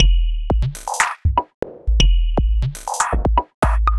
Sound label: Wood Block Klonk; Sonic Foundry; The Electronic Music Manuscript Collection; Drum Loop Structures